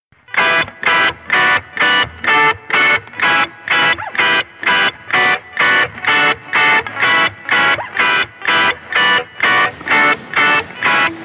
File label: guitar